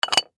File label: Clay Pigeon Set On Stack; Miscellaneous Sports; SoundEffects-Blastwave